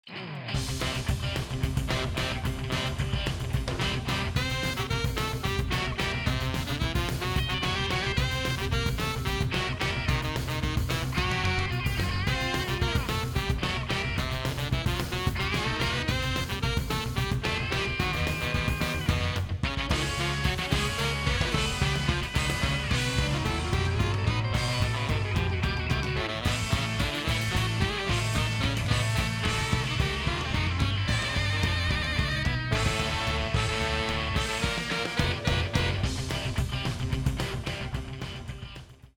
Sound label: BGM; PAPER MARIO Color Splash; wiiu